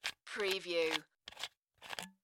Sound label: Bottle Cap Screw Off; sound effects bible